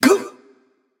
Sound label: grunt hit